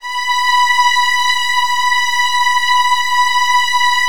B3, Strings; ILIO; Kirk Hunter Virtuoso Strings VOL-3; STR TAME

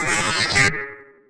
destruct, signals; AKAI; FX SIGNALS

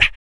BOOGA BRETH, Vocal Planet Blues & Soul; ILIO

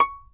fiddle pizz C#6 R; Sampleheads; Whole Lotta Country 1 & 2

